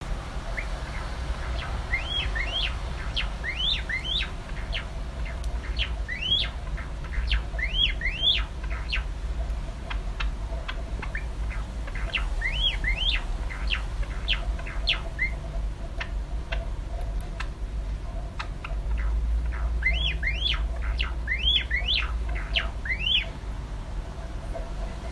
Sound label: buncho